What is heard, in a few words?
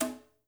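brush conga, right; Spectrasonics; Liquid Grooves 1 & 2; CONGAS+BGOS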